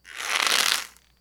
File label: rope